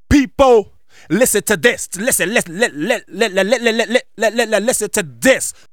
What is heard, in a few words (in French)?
Choeurs & effets; L'Effet Pommier - Volume 04